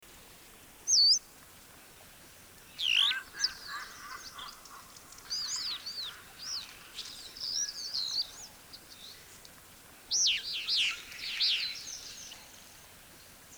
Black saw wing; پرنده اره بال سیاه

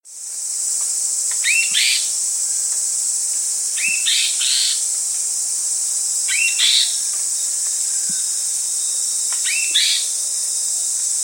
Guianan warbling antbird

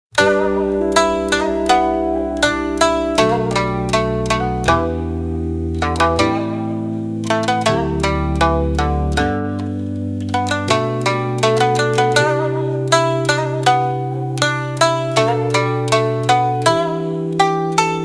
Shamisen; ساز ژاپنی

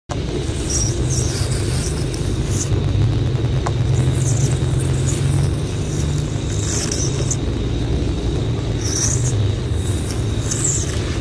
nails on wall; ناخن کشیدن روی دیوار

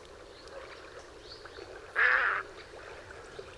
Little egret

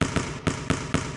Punching Bag

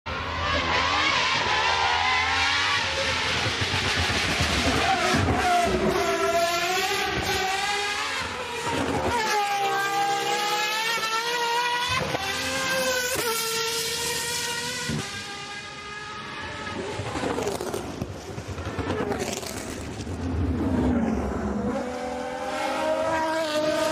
Gordon Murray, اگزوز

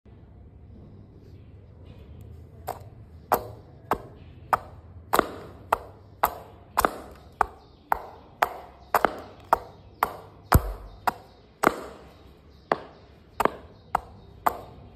Mosaic; معرق کاری

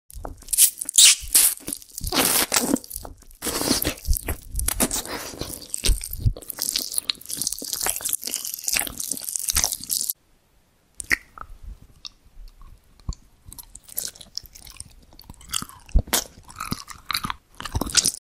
eating jelly

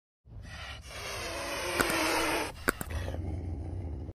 ANGRY WILD CAT

